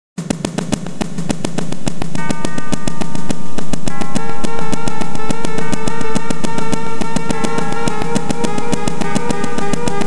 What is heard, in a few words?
kick; Another 13 beat rhythm, this time on vibraphones and castanets.